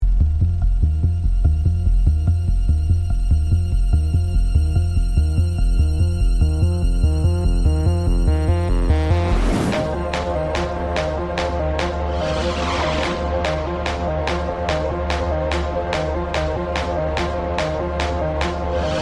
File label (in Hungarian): start screen theme szöveg nélkül